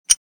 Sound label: Military hook clipping metal; Military Equipment